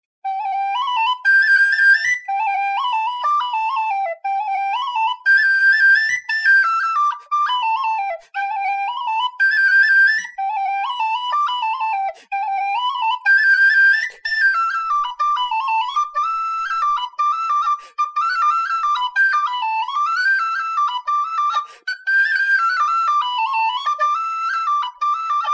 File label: jig, tinwhistler